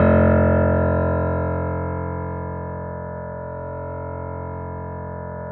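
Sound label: grand piano F 0; USB Soundscan; AKAI; Keyboards Acoustic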